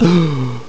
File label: Warp; Ddriving; 501 Great Games - Volume One; Guildhall Leisure Services